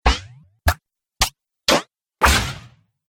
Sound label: Percussive Hits, Sci Fi